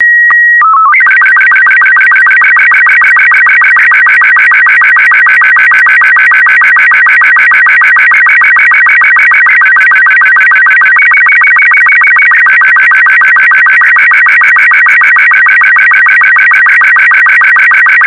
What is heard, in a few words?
signal